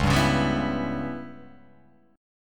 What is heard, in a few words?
C sharp Minor 7th Flat 5th